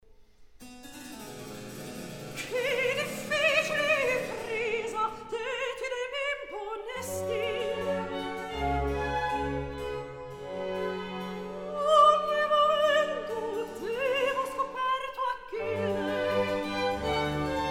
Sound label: Recitativo accompagnato; Che difficile impresa